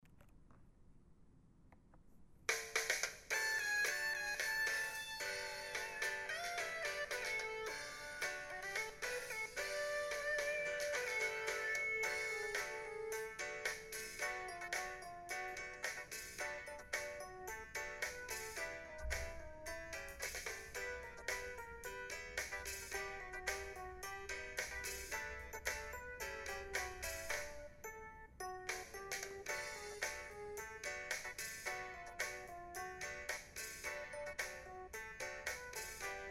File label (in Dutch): lied karaoke versie; muziek onze stad groep 3 4